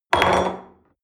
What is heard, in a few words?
espresso upset table medium short